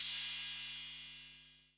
808 CYMBAL; E-MU Formula 4000 Series Vol. 2

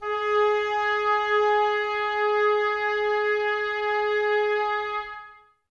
OBOES G#; Miroslav Vitous

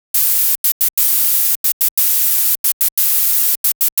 Gated Noise 120BPM; musicradar

